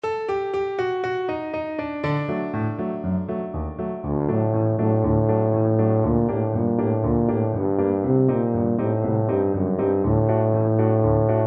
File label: et dodim kala, tuba